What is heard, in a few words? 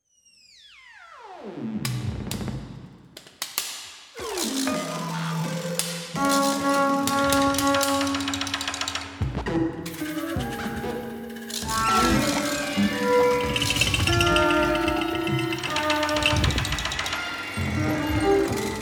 Fragmentation Orchestra; Ball Variations